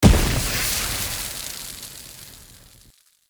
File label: Fire Push Impact; Powers; Fenn